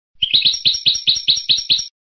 bibleos golpeados graves, campero

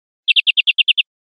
chorros cortos campaneados, verderon

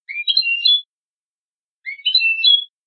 quejas comp

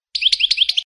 floreo tobi tobi; campero

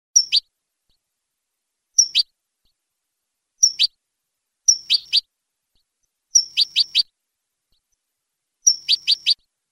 padreos sueltos; campero

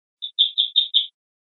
primaveras, verderon

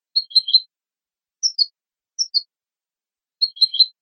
adornos

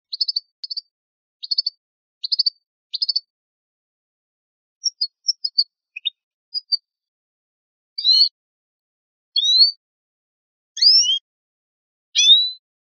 faltas pirreos pitadas

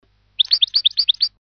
campanilleos capi capi, campero

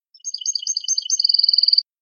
canario; campero

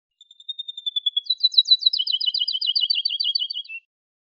acanariados, verderon

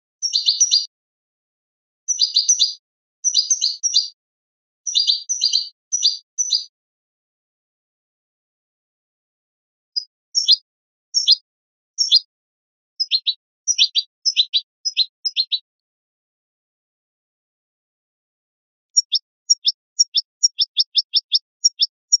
repertorio padreos sueltos, campero

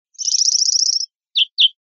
gorrionada, verderon

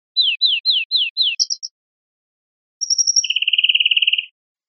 faltas chinchines; verderon

